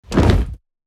Limp Mass Hit Floor

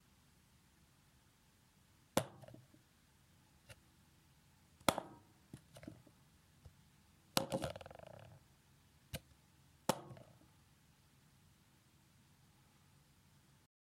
Plastic Cup On Wooden Table

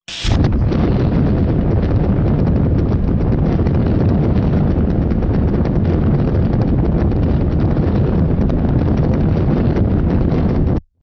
Rocket Launch

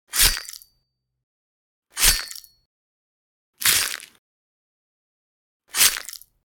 Sword Cut Off Neck Or Slash Flesh Sounds

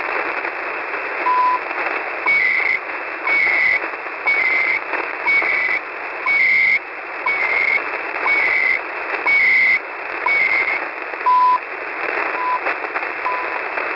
Call Sign Shortwave Time Signal Radio Station; Chu; Caribean

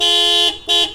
Car Horn Honking

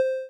Blip

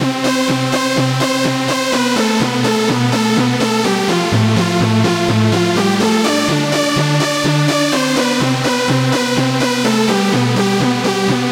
Dark Dance Lead

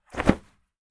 Jacket Cloth Rustle

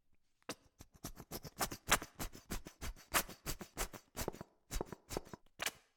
Shampoo Bottle

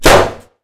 Gun In Small Room

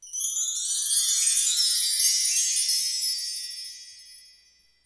Chimes Gliss Up

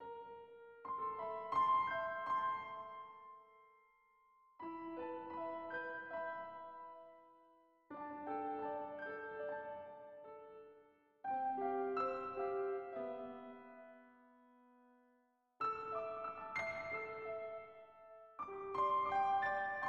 Anime Piano Soundscape

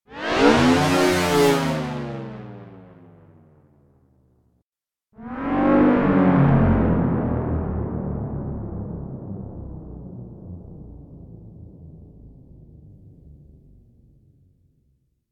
Martian Ship Passing

